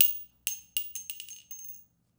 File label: Shell Casing; Adobe; after effect; Action Essentials 2; Bonus Sound FX